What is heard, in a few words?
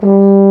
BRS BARI G2, FRENCH HORNS; Roland - LA Composer vol. 1